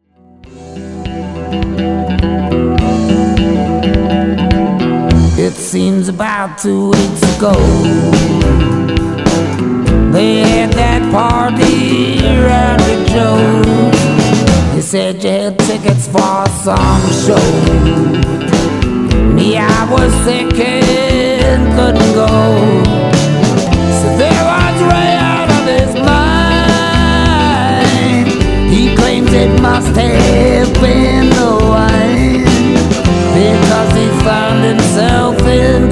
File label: ooh